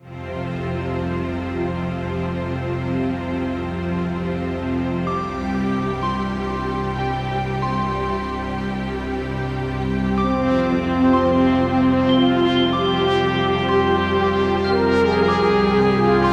idle menu space watching stars, good for Menus or Space Theme; anipatok game music